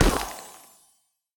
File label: ball hit cold medium; CrossCode; battle